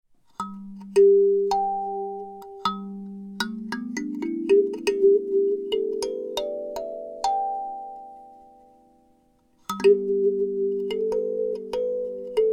Box Penta G, kalimba

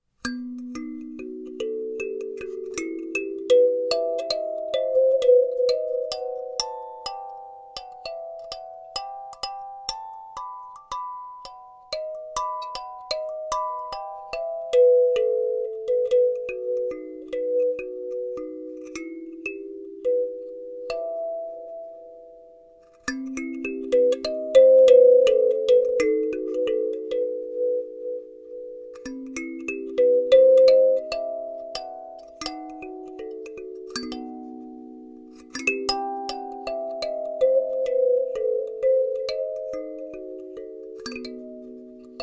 Treble Mid East, kalimba

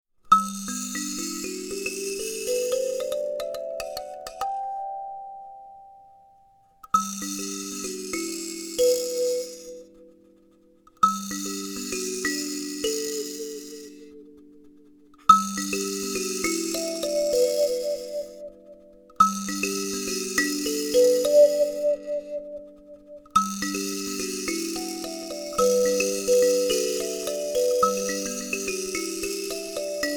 Karimba Box G Major

